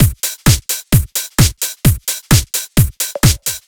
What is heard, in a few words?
Drum Loops, 130 BPM, Underground UK House & Electro